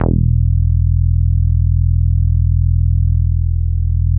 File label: bass, synths